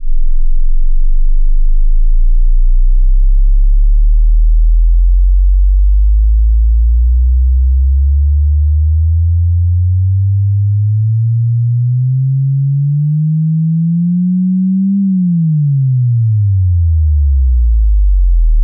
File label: headphone shaker